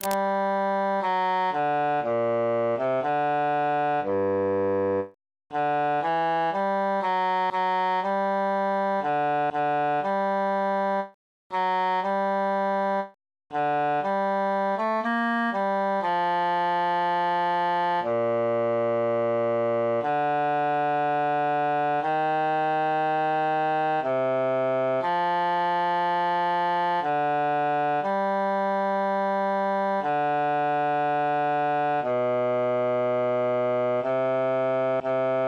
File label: bassus solo; adew sweet amarillis